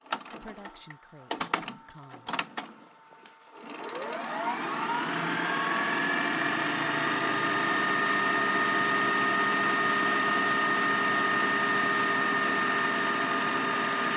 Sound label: VHS input rewind; SoundsCrate